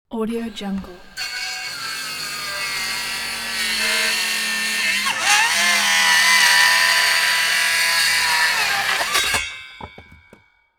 circular saw cut

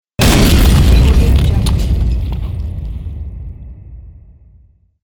explode

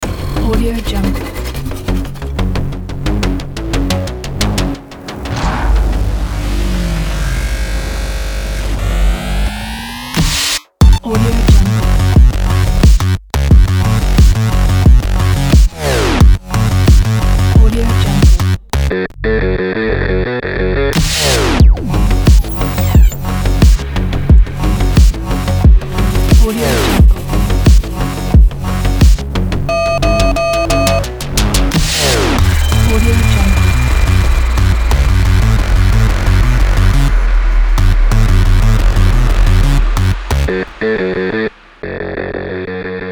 sport car commercial

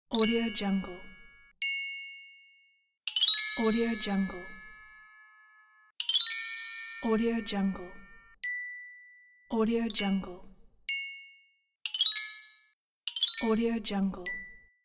glockenspiel bells pack

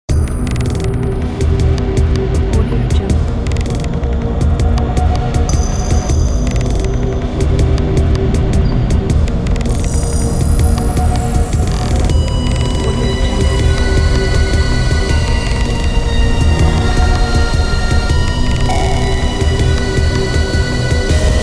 creepy scary

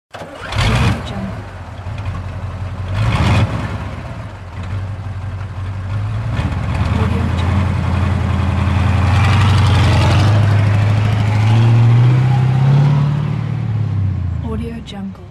cargo car

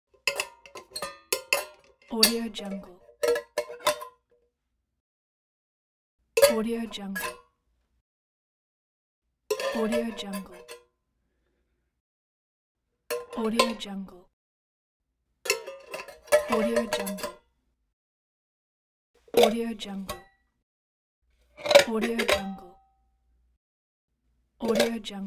food can metal lid foley; applehillstudios

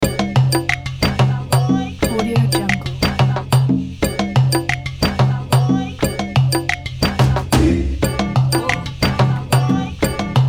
african epic drums

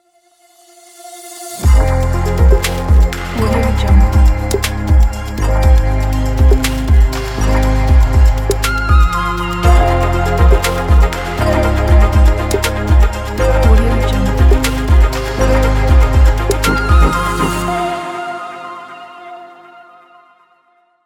logo digital

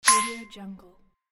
ding

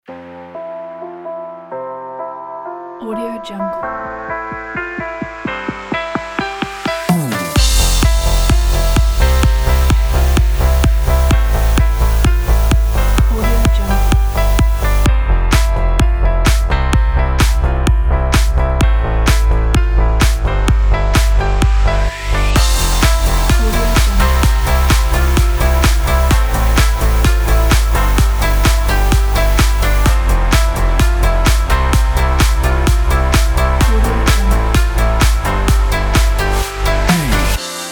summer party opener; selectedsounds

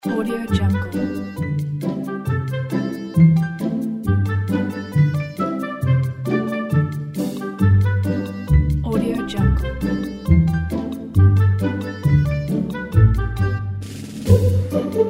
funny kids pranks; marketofmusic

